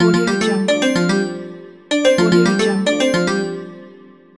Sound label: ringtone